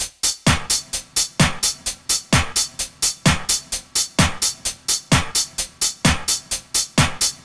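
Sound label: Full Drums No bass drum, 129bpm, Nu Dance Electro; Zero-G - Soundclash; Dead And Alive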